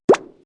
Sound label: pickup